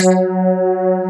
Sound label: wet bass